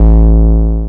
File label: attack, BASS, Techno Trance